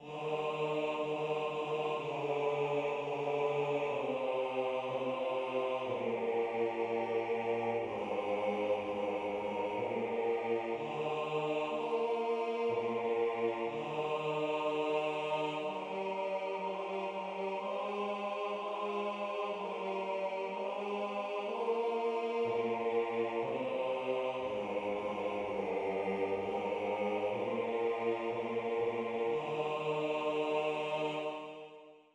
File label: Bass